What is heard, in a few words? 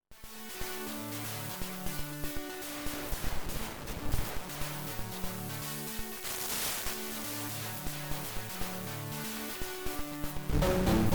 Preenfm3 FM Synth xor lp fold